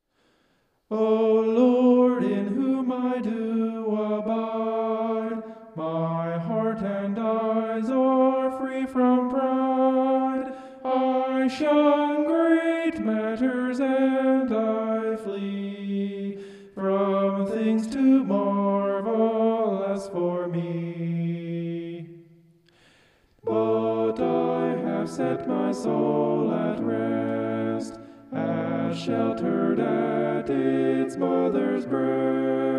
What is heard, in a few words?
vocal homoph; psalm 131